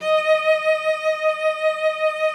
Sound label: D#5, cello vib; Xsample VOL-7